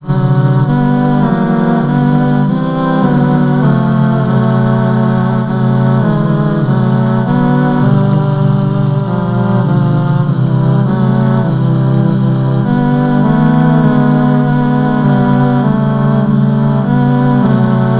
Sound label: tutti, ttbb